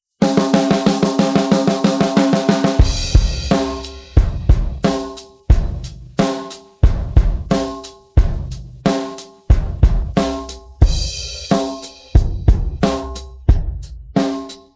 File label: pattern, drums